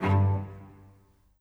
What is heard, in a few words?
Cello, Orchestral; Sonic Foundry (Sony Creative Software); Orchestral VOL-2; Modern 09